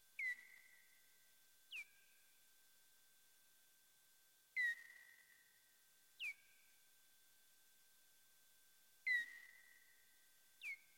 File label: N. noctula, bats